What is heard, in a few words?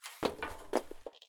turn metal; Escape from Tarkov